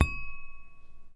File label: Small Glass